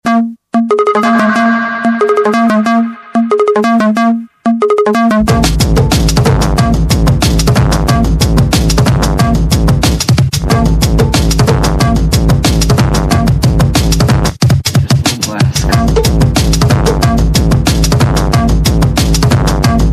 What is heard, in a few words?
just a little brass